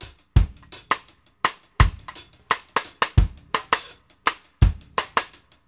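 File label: BUSY X STICK, REGGAE 84; Sampleheads; New York City Drumworks VOL-1; KD REGGAE 84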